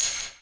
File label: barrier shatter